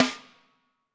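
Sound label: snare drum, Drums; Bob Clearmountain Drums I; SD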